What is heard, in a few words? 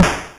snr; Stereo